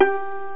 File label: pluck, net instrument; BrainOpera; net-music; Internet 1996 World Exposition